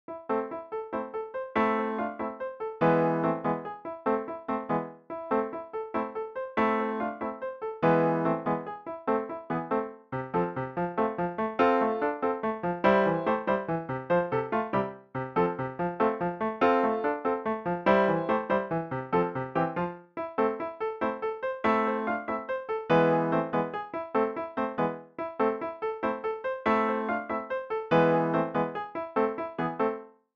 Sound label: the wild horseman piano